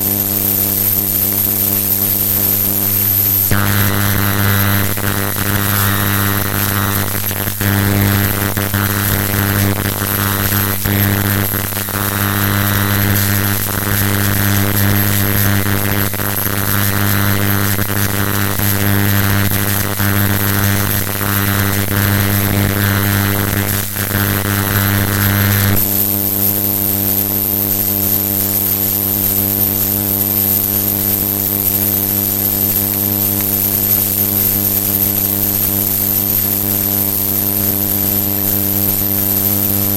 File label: recording plasma globe thinking mic